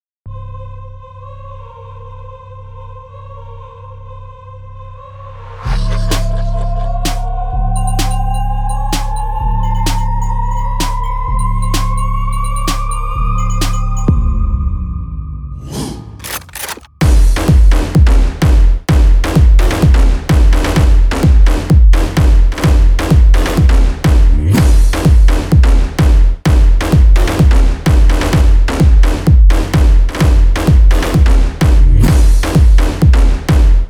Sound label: Dark Hard House